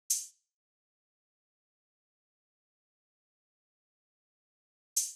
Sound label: Hihat Open 148BPM Gm Modern Analog